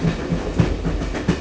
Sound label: train loop; Soultree